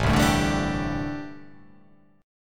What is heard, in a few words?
A Minor 11th A, Chord